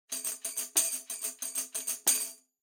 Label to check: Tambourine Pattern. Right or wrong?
Right